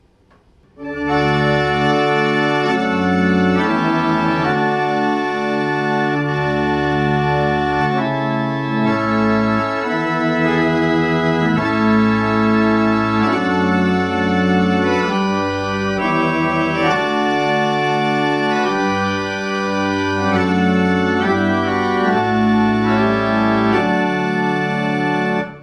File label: Double Chant, barrel organ; Boyce